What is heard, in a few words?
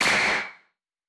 anime hit